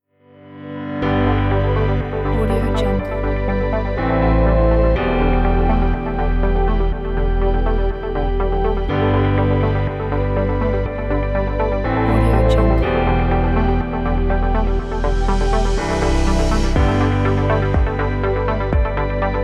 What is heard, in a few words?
background atmospheric music